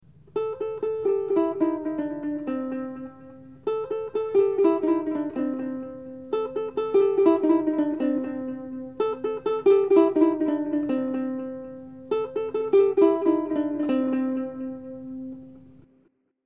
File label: Uke Delayed